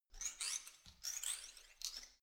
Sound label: organism short